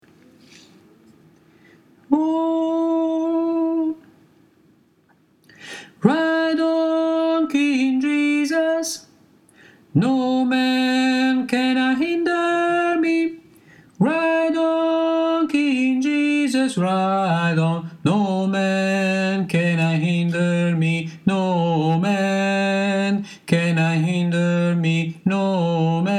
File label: tenor